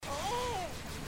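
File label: aaaooohh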